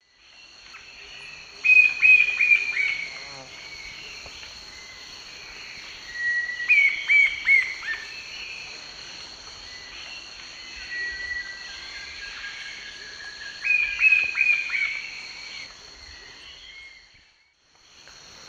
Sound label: Celeus flavus, group calls; S. America; Eastern Lowlands